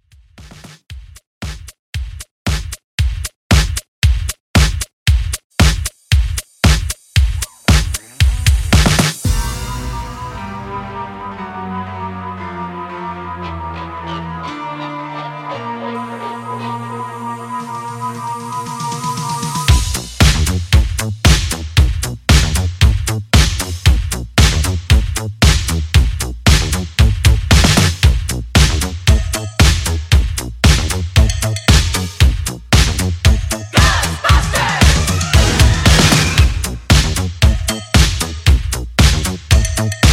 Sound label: 80s Halloween Redrum 115 Bpm